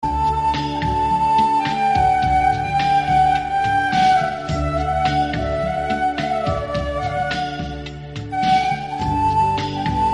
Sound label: song instrumental